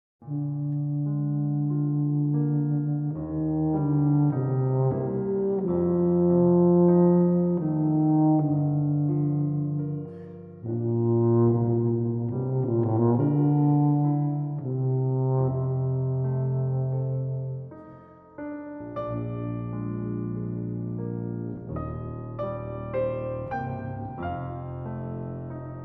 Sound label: Largo, Sonata for Cello and Piano in G minor